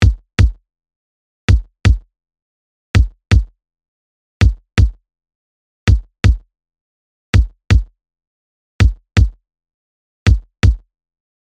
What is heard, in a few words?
Kick dry